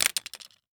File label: Household Knife Butcher Small Hitting Cement